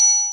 Button; 特酷声音素材库; 按钮

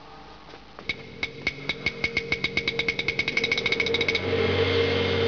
Fight; 特酷声音素材库

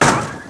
Fight; 特酷声音素材库

